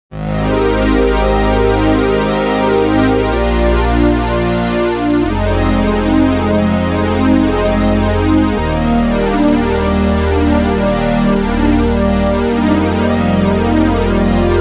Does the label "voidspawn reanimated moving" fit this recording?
No